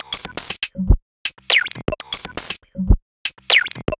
Odd Glitch, 120 BPM, Percussion; Sonic Foundry (Sony Creative Software); Underground UK House & Electro

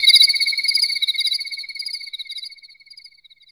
WS BIRDS; Back In Time Records; Korg Universe VOL-1; DRUM FAMILY

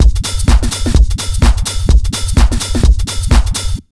127 BEAT, Disco House

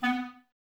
Clarinets, Wood Winds

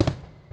PERC COMBI; Crypton; DrumBase5000

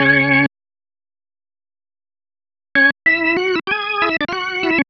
Organ; Sonic Foundry (Sony Creative Software); Club Joints and Street Anthems

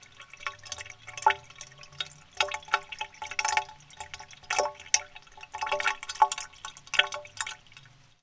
WATER; Rarefaction; Poke in The Ear With A Sharp Stick

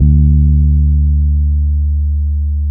D 3, hood boom, bass; Spectrasonics; Bass Legends CD 1

